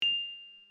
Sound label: bling